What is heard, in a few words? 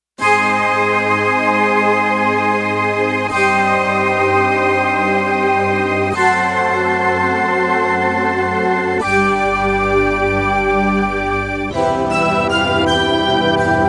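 Harp Flute Stack; Digital Synthesizer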